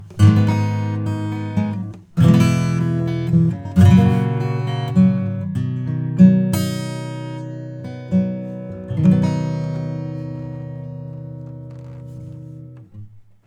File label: Transient Shaper Attack Sustain Sharp Loose; Neutron 4; Attack 6; Sustain 2